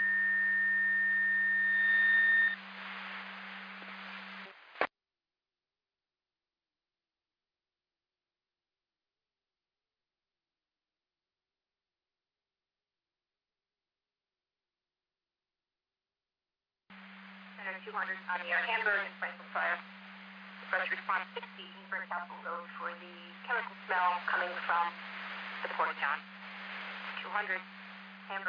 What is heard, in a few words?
Siren; Hamburg FD